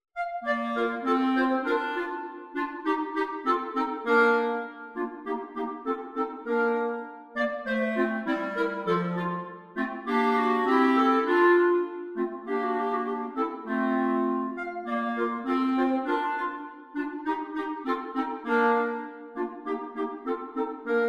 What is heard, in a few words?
clarinet trio; Allegro; mozart